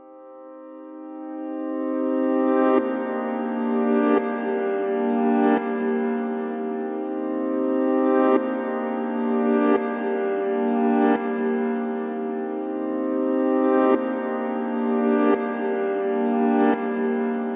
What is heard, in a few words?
172 G atmos music